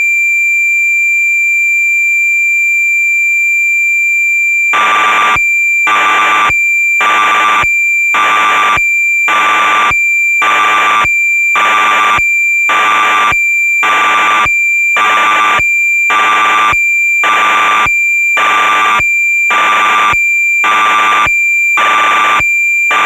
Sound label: Yamaha DX9 factory presets percussive, Cassette Interface; Synthesizer Factory Patches